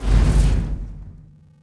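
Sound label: metal slide large; objects; Star Wars Obi-Wan; XBOX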